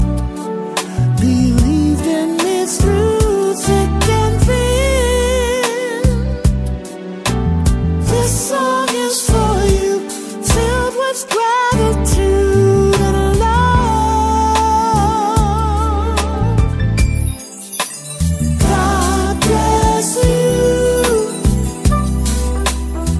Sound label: classic love song